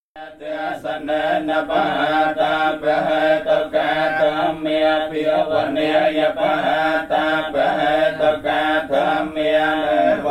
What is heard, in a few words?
prayer pagoda; sounds of angkor